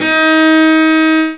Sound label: cello; Sound Effects for Multimedia - Volume 1